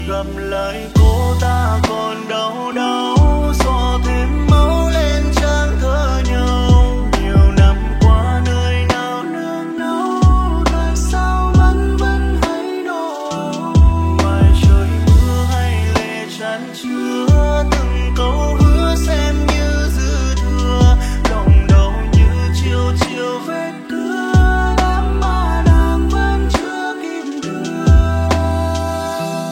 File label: Lofi Ver